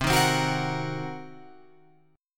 C Minor 7th Flat 5th C down Guitar Open F; Cm7b5 strummed; JGuitar

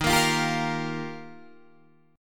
Eb Major Add 9th, Guitar, Open D

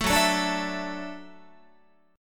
F sharp Minor 7th Sharp 5th, F sharp, Guitar; strummed; 12,14,12,12,13,15; 4A,4E,5A,5D,5G#,6C#

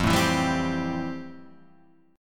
A-Minor 7th F; Am7/F strummed; JGuitar

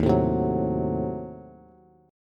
Eb Diminished 7th, Bass; Ebdim7 strummed; 11,12,10,11; JGuitar

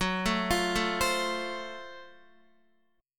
Gb Minor Major 7th Flat 5th, Guitar, chord; arpeggiated

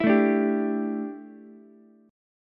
D Suspended 2nd Suspended 4th D down Ukulele; strummed

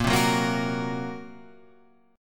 A sharp Suspended 2nd Sharp 5th A sharp down

